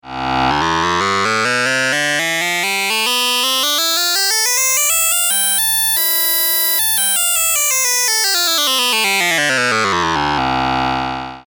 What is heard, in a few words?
Simple Wavetable Oscillator